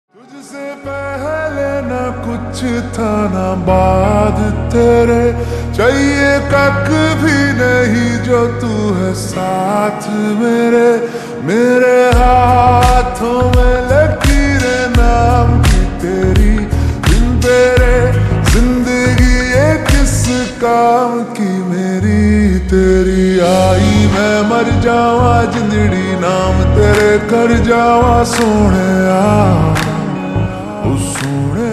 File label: Slowed Reverb Ringtone